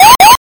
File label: warning